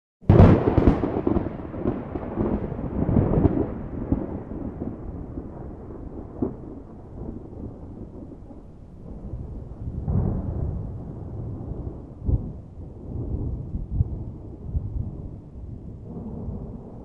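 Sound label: medium thunderclap without rain with rolls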